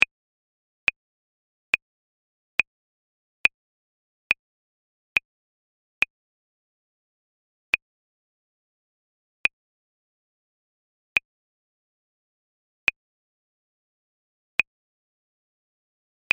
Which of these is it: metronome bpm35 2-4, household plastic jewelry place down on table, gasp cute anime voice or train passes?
metronome bpm35 2-4